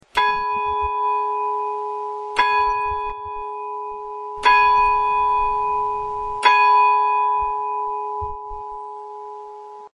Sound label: chime